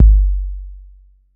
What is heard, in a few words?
BD 808; Electronic; Wizoo - Platinum 24 Electronic